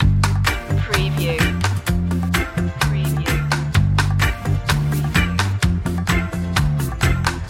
easy reggae vibe loop

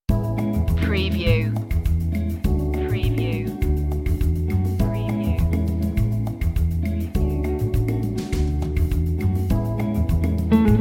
happy rhythm

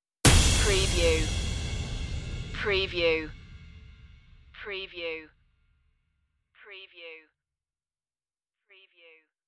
deep impacter stinger

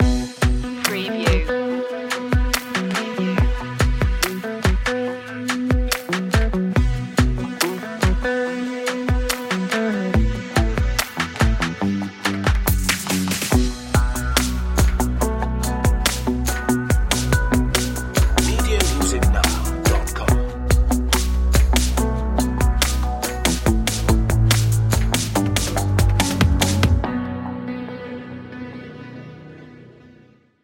dark trap

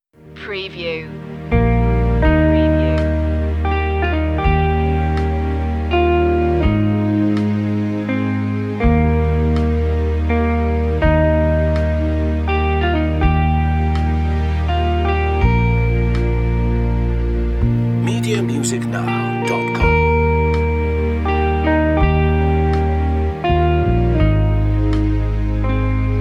amazing grace brisk 82bpm

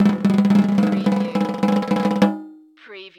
Cartoon Drum Roll

